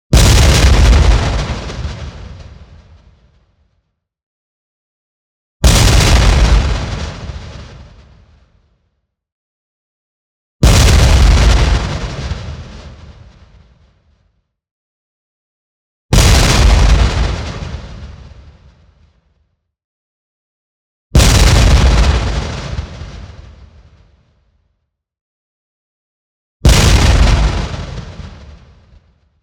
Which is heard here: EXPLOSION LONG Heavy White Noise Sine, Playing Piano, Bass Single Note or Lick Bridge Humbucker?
EXPLOSION LONG Heavy White Noise Sine